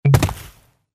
delete wall

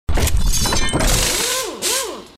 buy, tuning

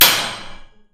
hit

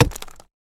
pickaxe hit

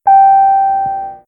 dodge, belt

